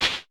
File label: CLAP, Vermona Mono15 Drums